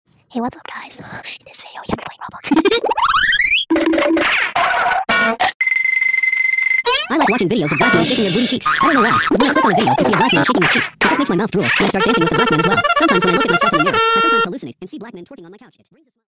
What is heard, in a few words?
weird ass sound